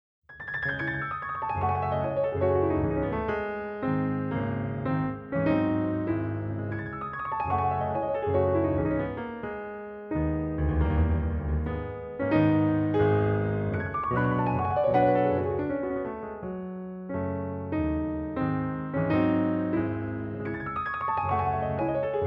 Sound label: grand; Chopin